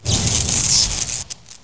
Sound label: cloak off; predator